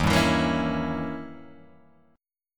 D sharp 7th Flat 9th, D sharp, down, Guitar, Open G